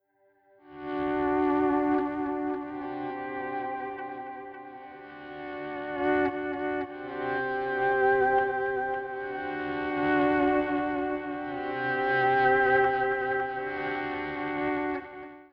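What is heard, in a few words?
Country Guitar (Bridge); Digidesign; Pro Tools Essential Loops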